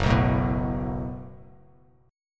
F sharp Minor Double Flat 5th F sharp, Bass Standard; strummed